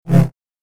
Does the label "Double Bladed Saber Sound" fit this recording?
Yes